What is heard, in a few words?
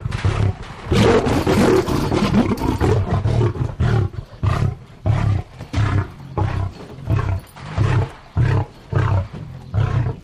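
Lion Eating Chomp Growl; big cats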